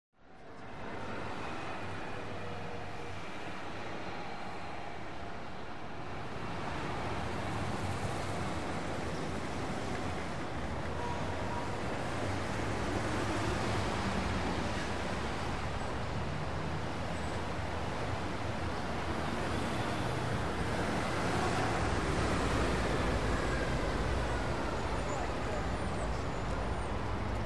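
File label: One Way Street Traffic, big city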